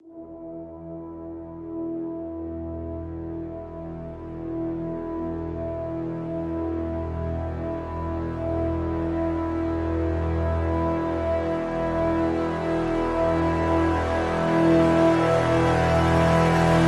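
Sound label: Crescendo Brass Swell Full (E)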